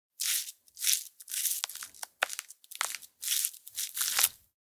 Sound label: Lotion tube skincare rub on face